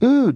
"ooh", voice

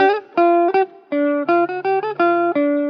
guitar, Loops, Phonky

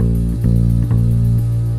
bass one note

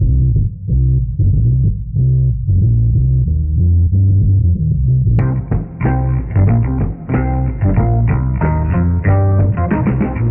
keys, Phonky loops

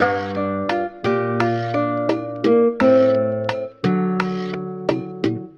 guitar, Loops, Phonky

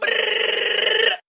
brrrrrr, Voice; ooh ahh

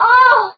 "oh", orgasm lady; ooh ahh

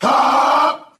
huh group of men

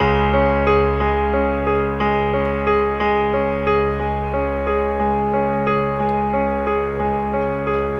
piano meow; Loops; Electronic

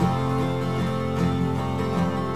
guitar, Loops, Sad

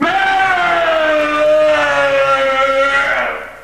scream long; Voice

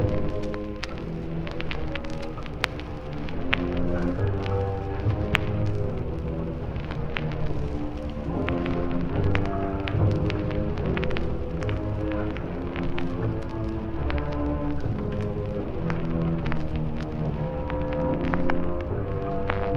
strings heavy record crackle, Sad; Loops

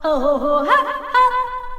oh ho ho ha ha; ooh ahh

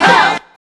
growl group of men; ooh ahh

